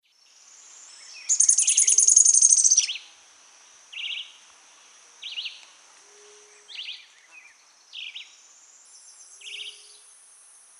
Cranioleuca obsoleta song; recorded Santa Ines, Departamento Itapúa; Myriam Velázquez November 2001

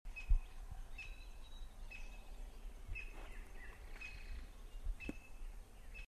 Colaptes melanochloros

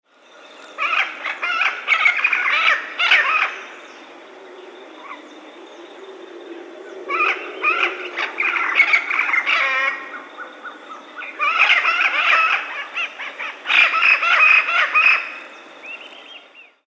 Amazona vinacea; Golondrina

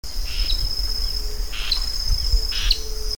Drymophila malura jerrit

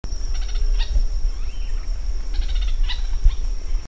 Synallaxis ruficapillus call

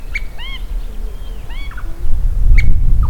Myiarchus tyrannulus

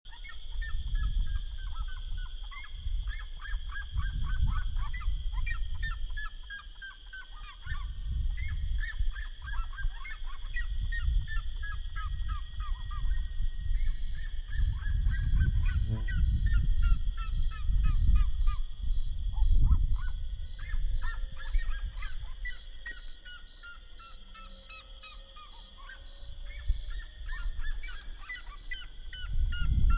Cariama cristata

